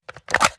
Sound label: ar reload magout; weapons; akilo47; viper